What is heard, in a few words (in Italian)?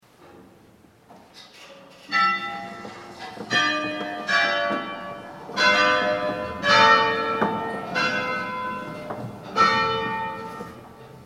Campane San Rocco